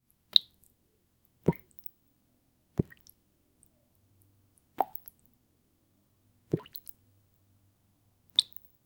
drop of skincare micellar

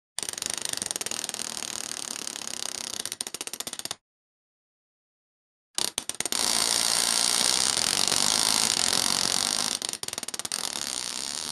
slots reel stop sounds

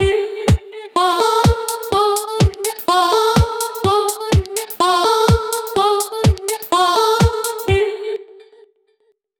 fast paced chopped vintage, 125bpm; SFX Engine

